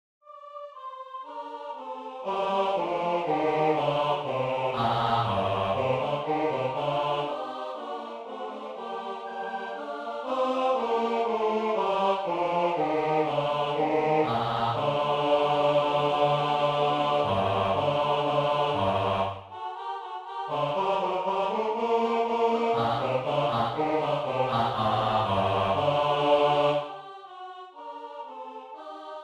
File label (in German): Bass, Oper; Aeneas